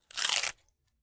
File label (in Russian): zvuk ukusa